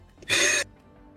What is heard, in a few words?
wheezer